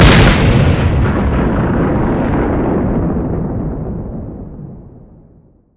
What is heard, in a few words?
Boom; Elite Gaming FiveM; Sound-Barrier